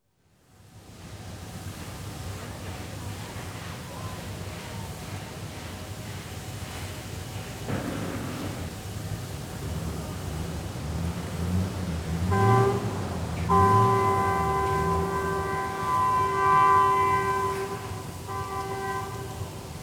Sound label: Steelworks, Anshan; China; BBC Sound Effects Library